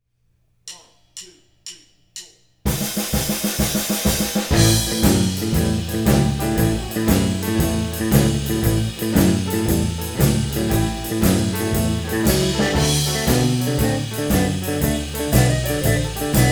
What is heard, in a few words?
Chicago Shuffle in A, blues